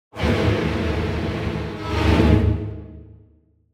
Symphonic Hit Short Tension Pad - Strings; Hollywood Edge; sound designer's toolkit v2

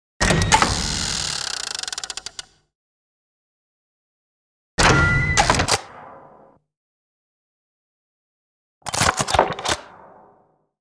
Trapdoor Lever Mechanism, 3 Versions; Sound Ideas; Series 2000

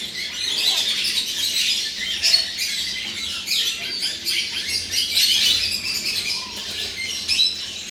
birds farm; best service Blue Box

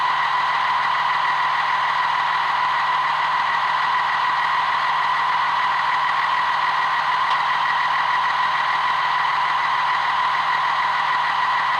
rover engine loop; Code7

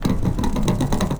Golf Ball Roll Metal Pipe; Misc Sports

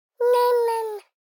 BABIES TALK Boy 12 months Nahemnahem; Baby Boom

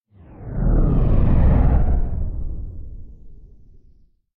Whoosh Low And Fast Pulsating - Flat; Hollywood Edge; sound designer's toolkit v2

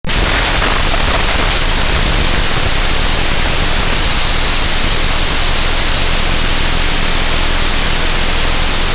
Door Vault Closing; 1001 Sound Effects; Foley

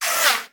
Gun, Bullet Pass By; gun and weapon sounds; captain audio; fully loaded

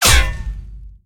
gunshot hit bullet; Blind Drive

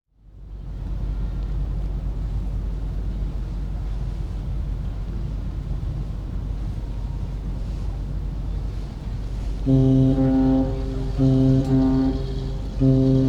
Ocean liner; Travel & Transport

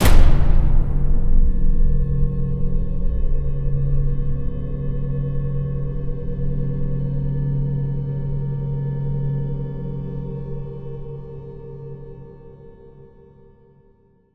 sting, Cinematic Metal Impacts; boom library

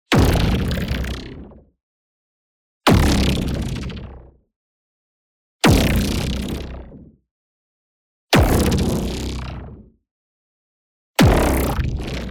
IMPACT LARGE Cyborg Armour Flutter; boom library; Matter

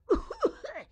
grandma short yell; Blind Drive

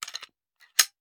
Hand Gun Foley; Sound Ideas; Guns

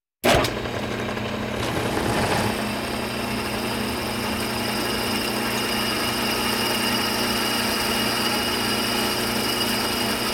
Walking Machinery Trucks

